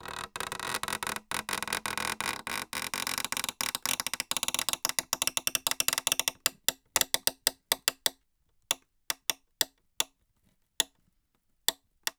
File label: Polystyrene Creak